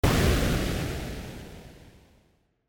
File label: Explosion